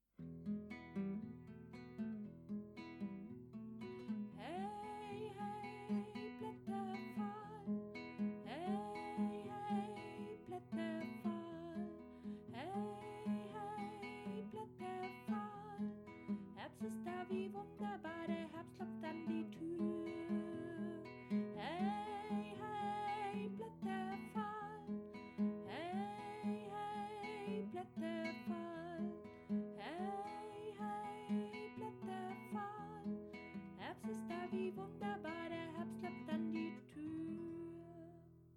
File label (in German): gitarre